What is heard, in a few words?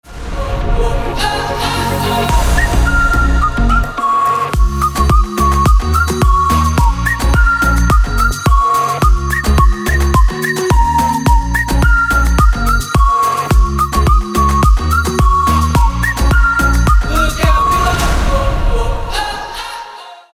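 funny melody with whistling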